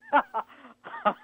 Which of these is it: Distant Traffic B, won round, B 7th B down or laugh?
laugh